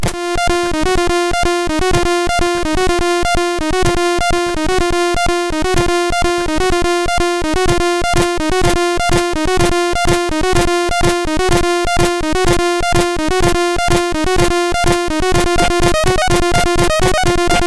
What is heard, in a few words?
Techno, MOS6581R3